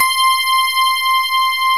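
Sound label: grand piano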